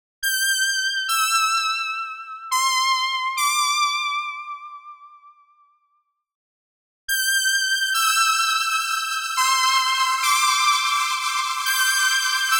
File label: Soft Synth; Albedo